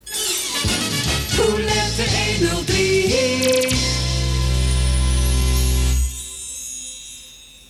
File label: silence